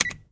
bomb beep

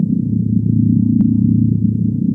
tech ambience

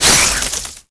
crawler attack

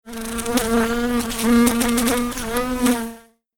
animal fly

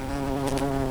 buzz; scmod; humanerror; agrunt

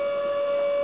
heavy platform

